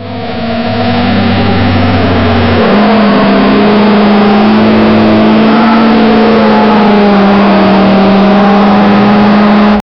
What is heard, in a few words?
engines roaring at; sportscar